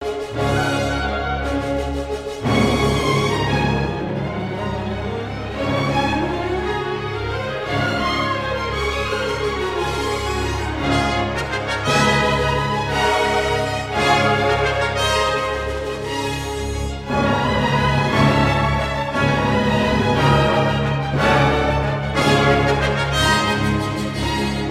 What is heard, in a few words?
violin, orchestra; Mahler 1.2; National Youth Orchestra USA; Mahler: Symphony No. 1, Mvt. II, Rehearsal 8 until 5 measures before Reh. 11